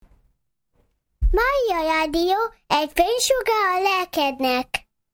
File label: Jingle; ATTILA DALOK